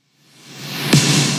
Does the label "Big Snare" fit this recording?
Yes